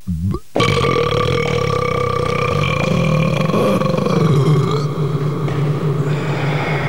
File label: burb